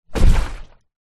transport boat rubber dinghy small land water hard